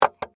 Object Set Down, Foley, Object, Set, Down, On, Table; Set Downs